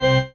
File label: marimba grave; ゆめ2っき